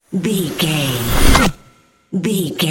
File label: magic conjure charge